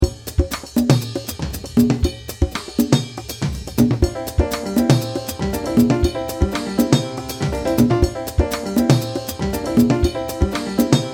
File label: instrumental